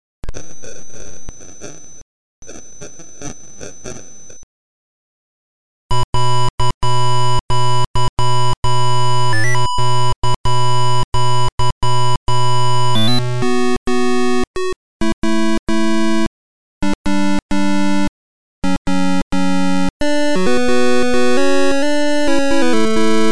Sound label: tandy, dosbox sound emulation